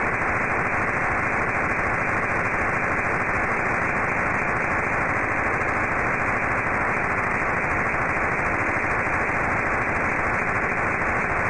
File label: P25 trunking channel; BC355N; 800MHz